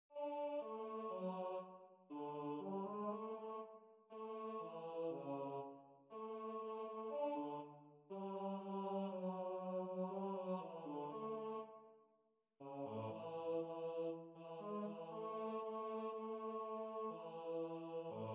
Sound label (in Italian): coro bassi; Idomeneo